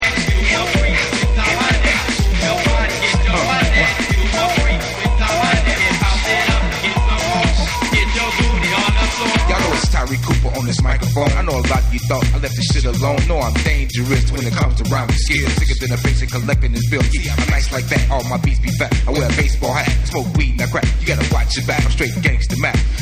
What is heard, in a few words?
Dub